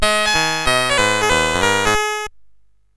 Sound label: sid CSG8580R5; Metro-Cross